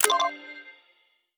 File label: quest subtask completed; Escape from Tarkov